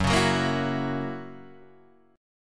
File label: Gb 7th Gb down Guitar Standard, chord; strummed; JGuitar